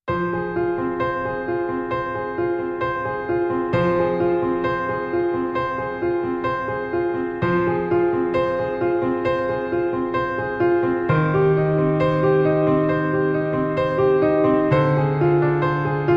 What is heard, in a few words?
Piano